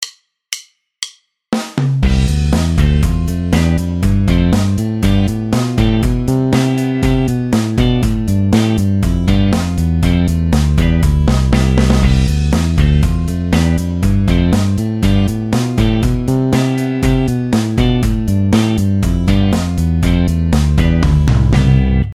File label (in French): accents exercice, basse; exercices